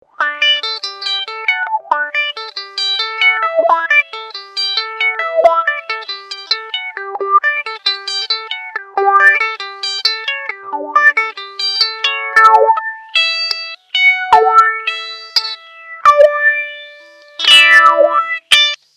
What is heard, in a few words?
wahwah